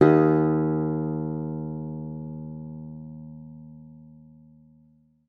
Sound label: D#2, guitar; Xsample VOL-2